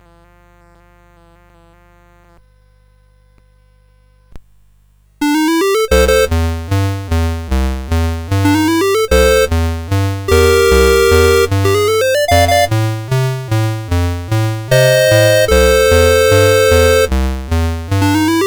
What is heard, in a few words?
Constant Bass, CSG8580R5